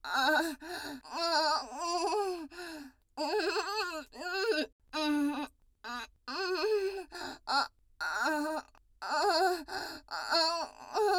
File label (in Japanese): 喘ぎ声